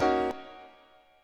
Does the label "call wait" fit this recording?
No